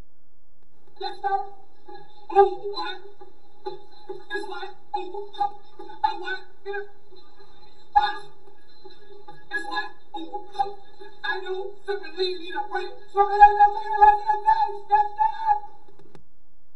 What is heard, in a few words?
spin round